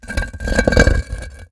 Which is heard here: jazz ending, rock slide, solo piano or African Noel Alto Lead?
rock slide